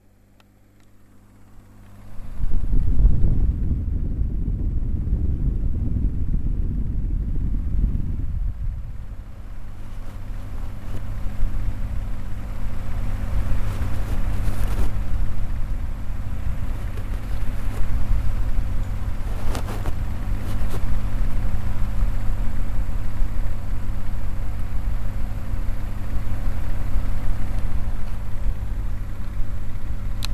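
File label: windshield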